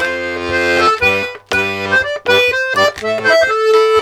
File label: Accordions, 120 laride